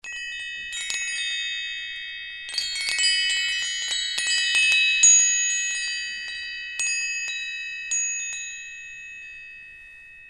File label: Wind Chimes small copper